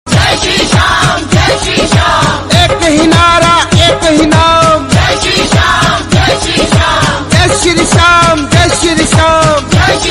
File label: flute ringtone, bhakti ringtone; Jai shree Shyam